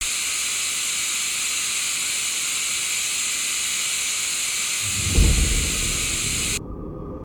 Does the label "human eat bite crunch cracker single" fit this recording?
No